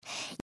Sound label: breath; pony; Twilight Sparkle